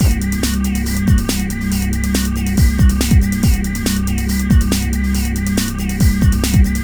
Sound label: Hardcore Breakbeat, 140 Cmin; Big Fish Audio - Hardcore Breakbeat Action; Hardcore Breakbeat Action CD1; 09 Get Down